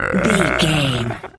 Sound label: zombie voice grunt